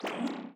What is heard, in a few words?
Litle Laser